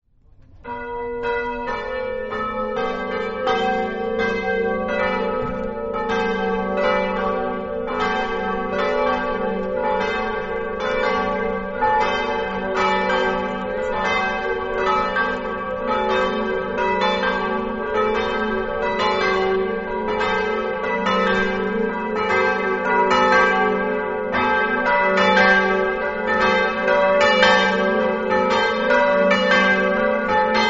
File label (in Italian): maseris, campane